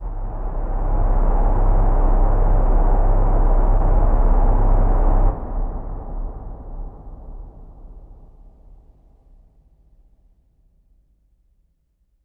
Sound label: IMPACT SCARY, Cinematic Impact